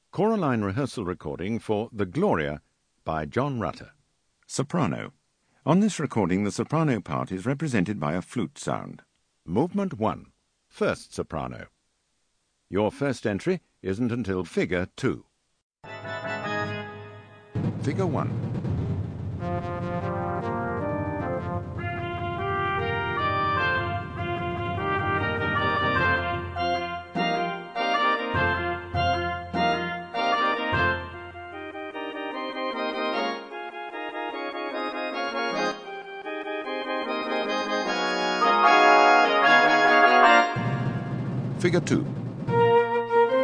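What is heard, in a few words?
Soprano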